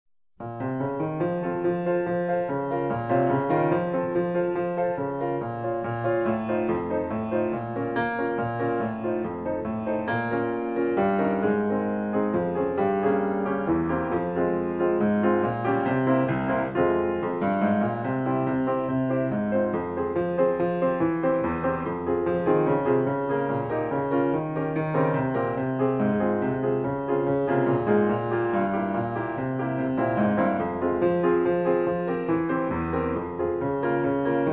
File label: acc; Danza, Danza, fanciulla gentile